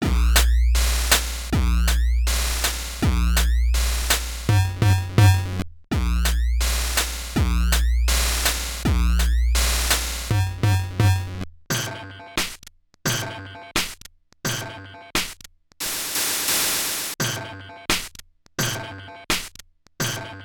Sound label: Atari Drums